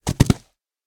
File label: chariot horse hoof; smite; Apollo